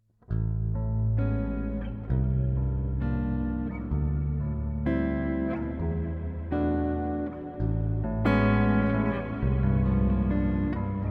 Improvisation

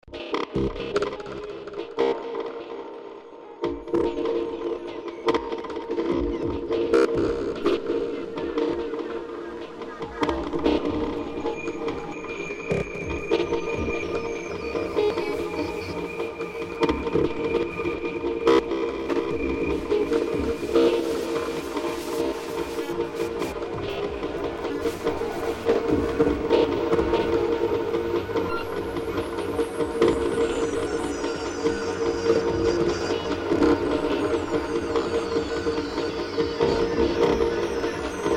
ambient drone; noisesurfer

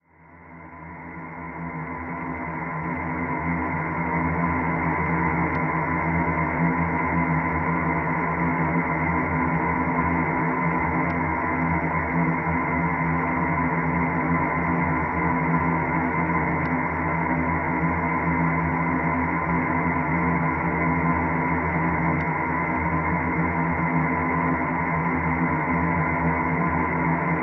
Drone Submix Mid Tri tone; STEM; CerebralAudio; SoundChaser

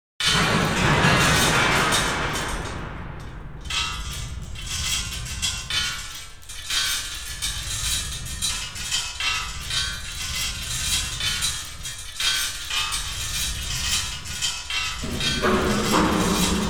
Metal on Metal

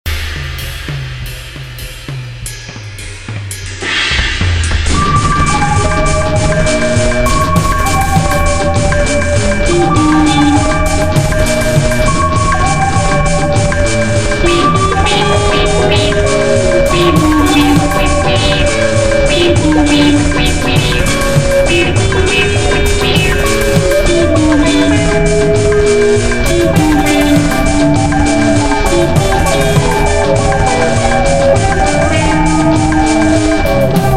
epileptic Drums

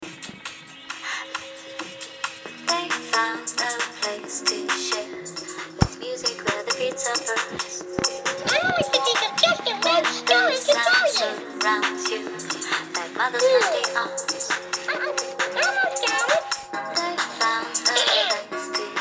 house music

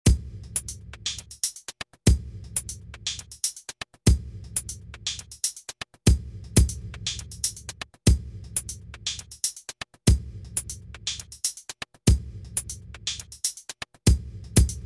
Room Ambience, Fireplace; ActivePhaze

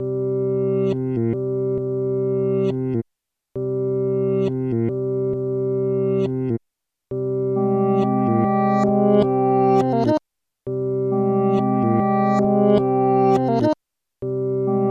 smooth; Thuoom

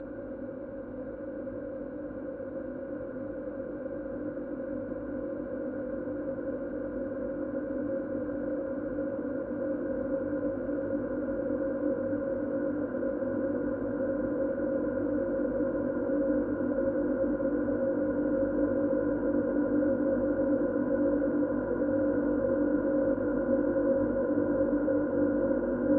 Sample Tri Tones Nine; SoundChaser; CerebralAudio

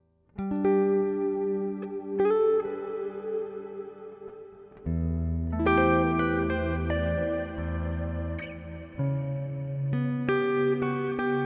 Improvisation

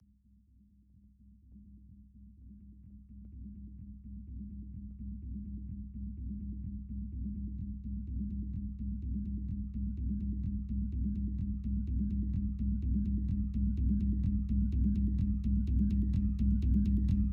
Tribal Noise

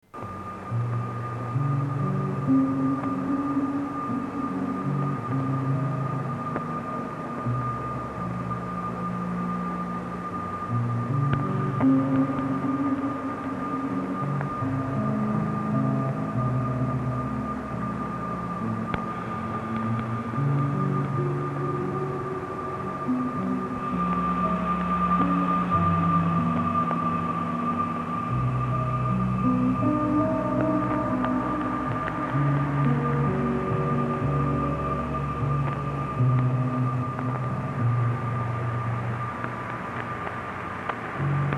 romantic guitar

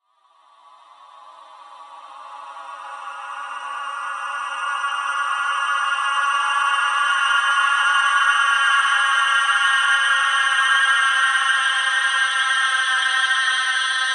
Sample Lower Shimmer; SoundChaser; CerebralAudio

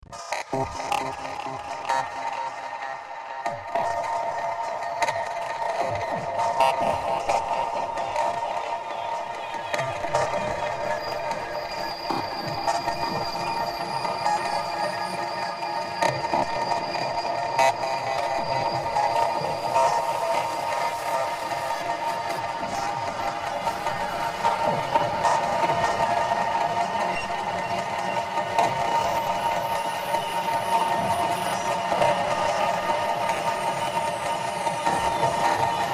ambient drone; noisesurfer

